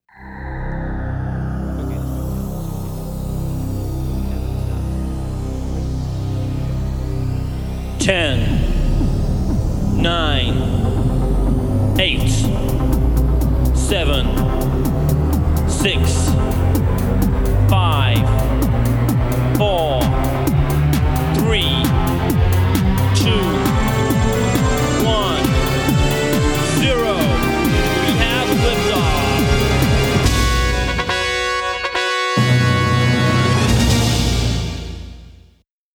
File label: rocket launch 10 0 short mix